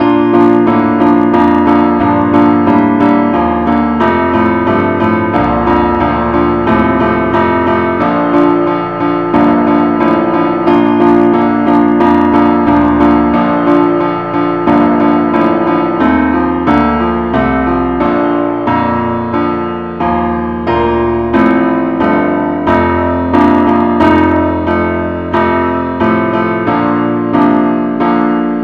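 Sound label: keyboard only key B flat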